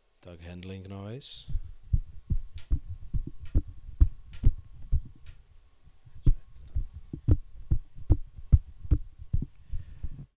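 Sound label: vocal, Handling noise, Sennheiser e945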